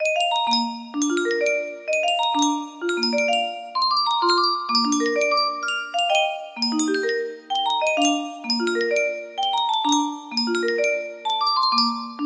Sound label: music box, music box melody